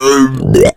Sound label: male boomer alert; voice; Left 4 Dead 2